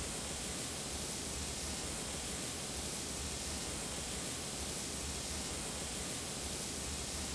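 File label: smoke stack loop; Star Wars Obi Wan; XBOX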